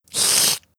cat hurt

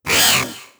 bat attack

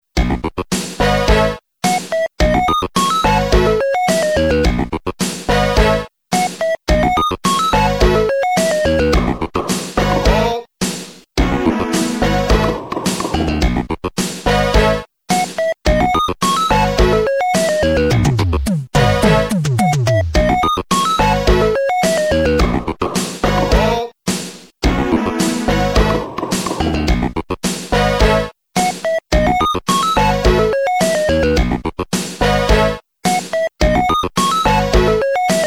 Jingles, Amiga; Advantage Tennis; Moonchild

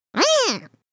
cat run attack

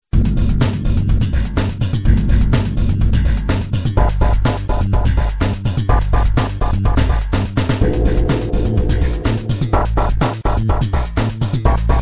Boss, Amiga; Alien Breed; Moonchild

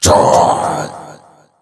death attack

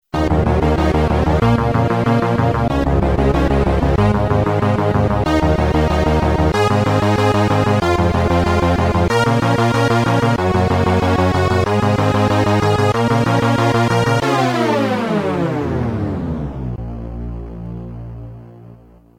Game Over, Amiga; Airball; Moonchild

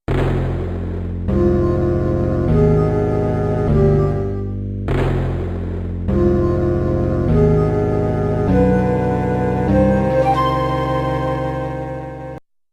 Game Over; Aladdin

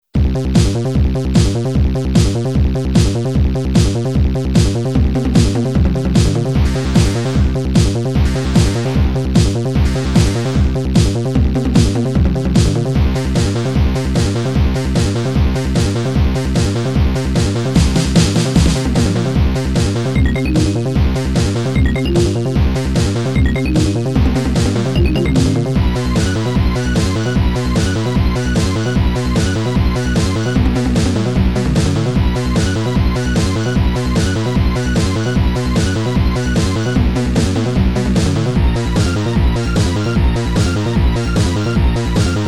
Bongo, Amiga; Moonchild

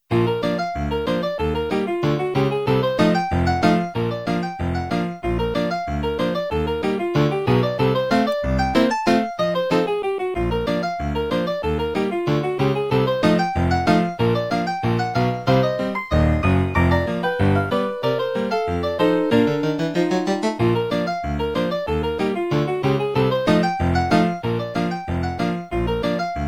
Pool Snooker, Amiga; Arcade; Moonchild

